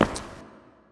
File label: foot tile echo; Zomboid 41.78.16; Project Zomboid